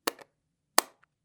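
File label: switch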